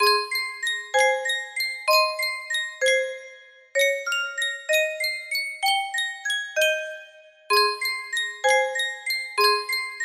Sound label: sankyo music box, lullaby